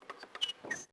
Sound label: Door Wood Small Closing; Max Payne